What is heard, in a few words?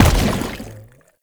Step Default, Footsteps; Warframe; Lasrian; Lasrian Soldiers; Foley; Lasrian Chem Strike Nox